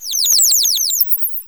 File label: relay burst; Station-0